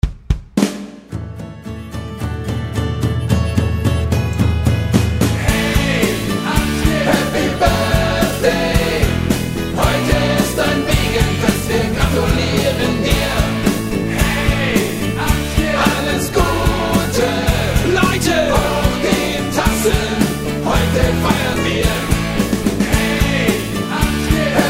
Happy Birthday Atje

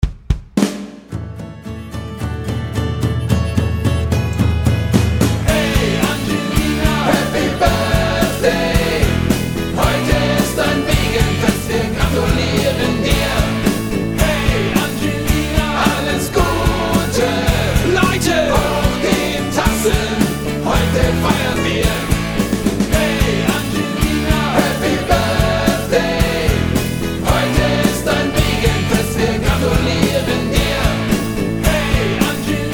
Happy Birthday Angelina

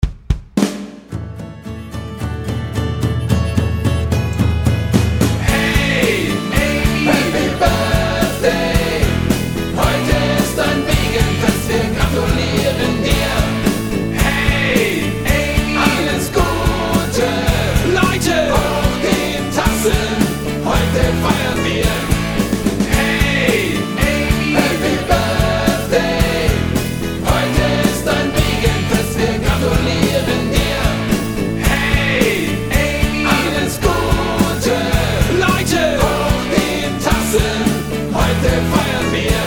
Happy Birthday Amy

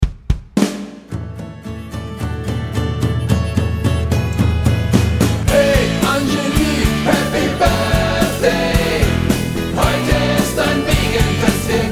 Happy Birthday Angelique

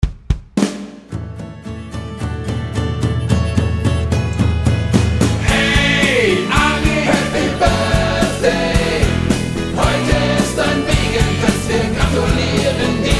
Happy Birthday Annick

